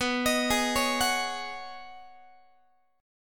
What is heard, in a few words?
B 6th Add 9th B down Guitar; arpeggiated